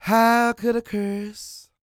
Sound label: croon, Vocal Planet Blues Soul; Spectrasonics